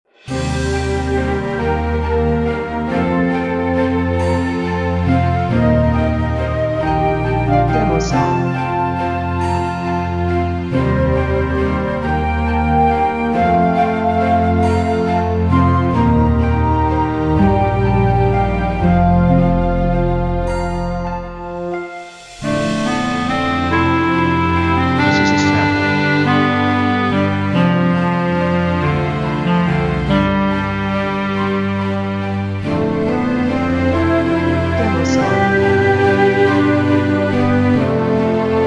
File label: Dreamy Fairy Tale Ending